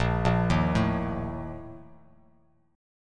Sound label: A sharp Major 7th Suspended 4th, Bass Standard; arpeggiated